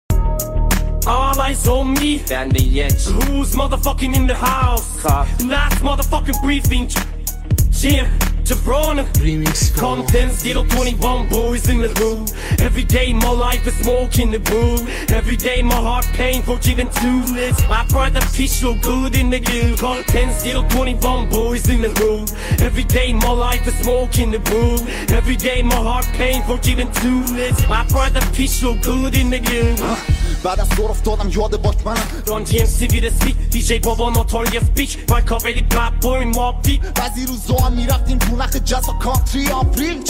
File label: Hip Hop AI